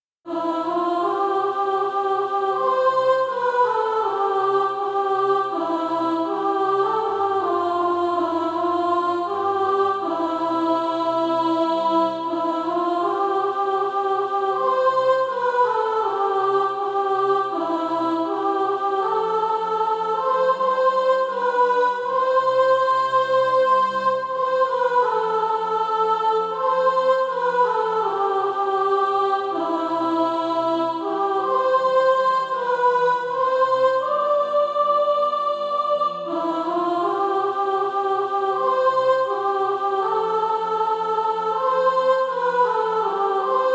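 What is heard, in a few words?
soprano, Practise Music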